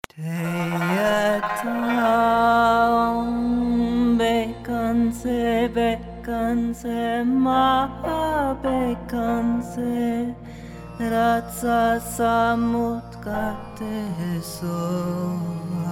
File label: Medicine Buddha Mantra Tayata